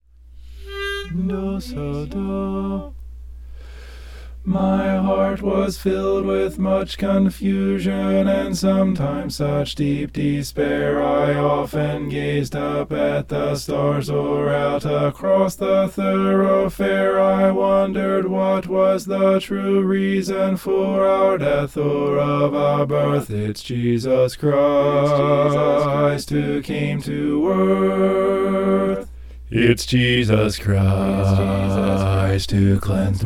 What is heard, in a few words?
bass